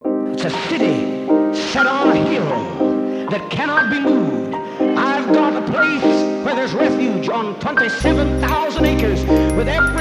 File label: Piano Interlude; chill